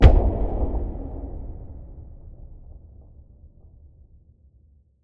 Impact Boom Thud; bottlerocket

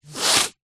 human sniff wet ill

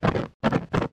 household matches flames shake

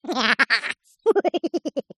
cartoon laugh high pitched

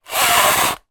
sport table tennis retractable net pull fast

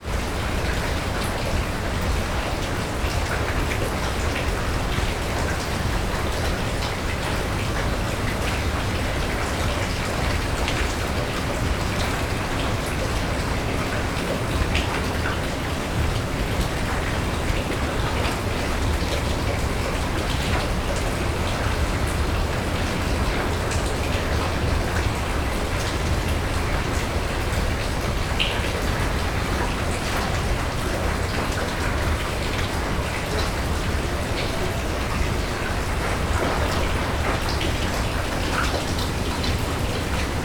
nature rain yard recorded from inside open garage

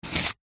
carrot chop knife single

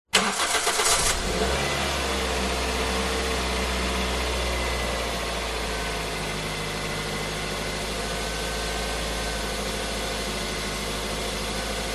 vehicles car start idle off engine perspective

